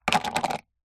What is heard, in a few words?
food candy bubblegum tape plastic tub set down